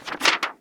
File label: page flip